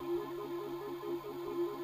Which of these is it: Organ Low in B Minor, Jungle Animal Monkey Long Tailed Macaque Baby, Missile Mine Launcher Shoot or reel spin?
reel spin